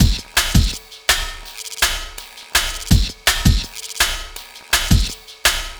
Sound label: Beat, Futurist Drum & Bass; Drums & Percussion